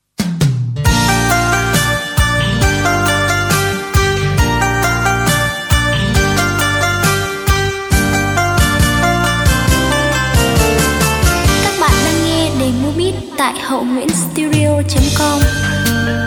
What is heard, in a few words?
beat, playback